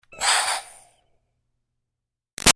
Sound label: Steam Release Iron Chuff Double; Miscellaneous Household; BigRoomSound